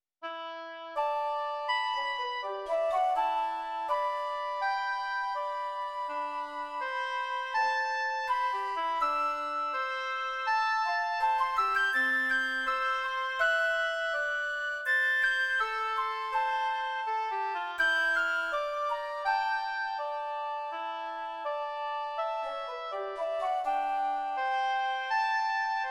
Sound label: bagatelles andante